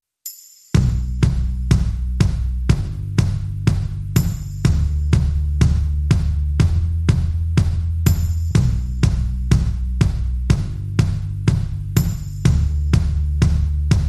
Drums and Bass Mix

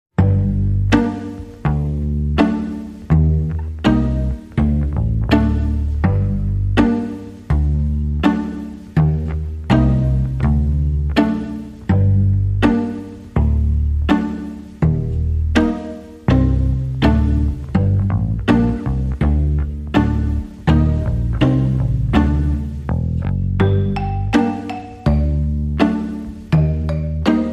No Drums Percussion

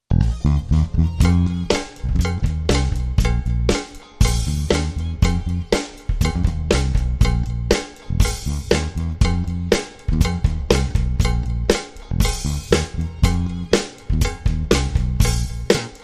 Drum & Bass

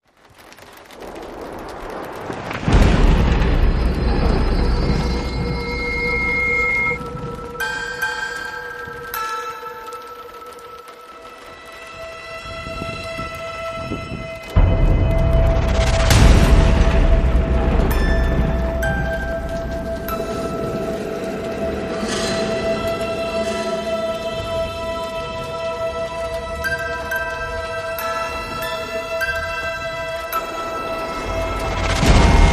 Raining Pouring Horror, Inst

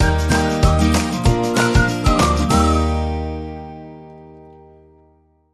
Bumper

